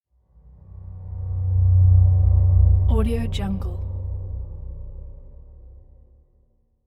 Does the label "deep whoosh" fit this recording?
Yes